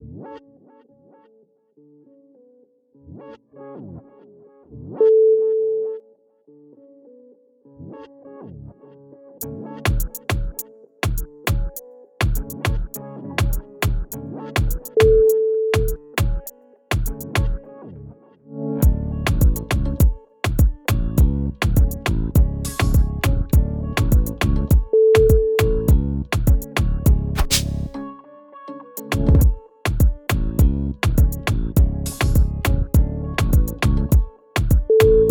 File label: soul regrets